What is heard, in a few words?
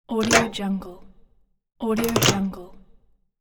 oven; minimal studio